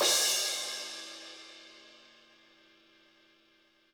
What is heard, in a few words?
HiHat & Crash, Roland TD-20; HiHats